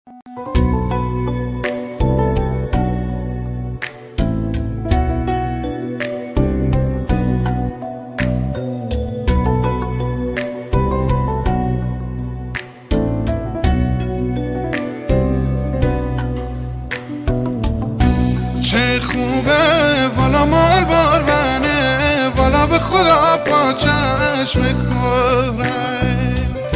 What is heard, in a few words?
bakhtiari music